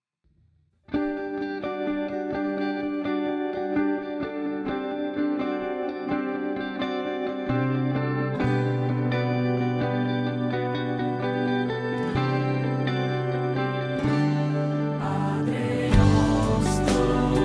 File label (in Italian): padre nostro voci contralti e tenori